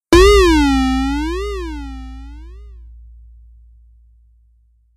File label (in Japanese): ぽよん音; 効果音se集